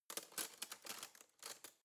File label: cutlery spoon drawer handle long